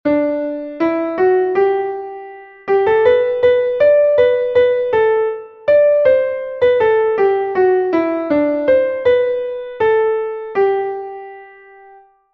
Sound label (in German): klavier; schoen ist die welt